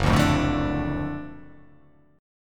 F sharp Minor Major 7th Sharp 5th

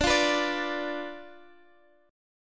D Minor 6th Guitar Standard; Dm6; 22,20,21,19,x,x; strummed; capo=2

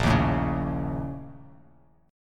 Bb Minor Major 7th Double Flat 5th; BbmM7bb5; strummed; 6,6,7,6; JGuitar

